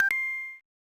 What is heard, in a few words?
Unused Jingle